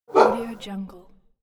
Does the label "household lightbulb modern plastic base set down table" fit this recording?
No